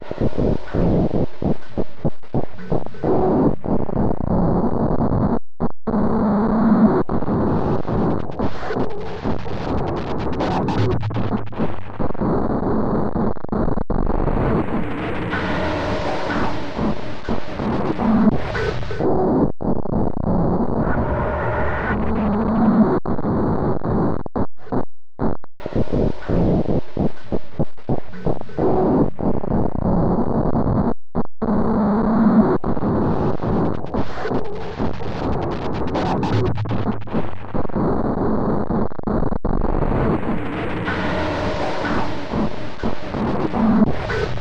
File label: ambient in the dark